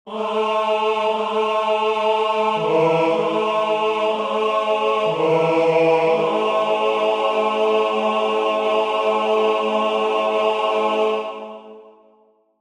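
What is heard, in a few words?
Surrender tenor, wisdomchant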